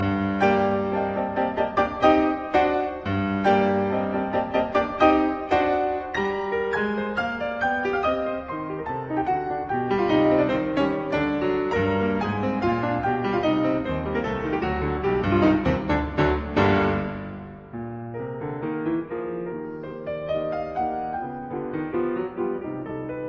Piano Sonata in G minor Scherzo Sehr rasch und markiert; Op 22; Juana Zayas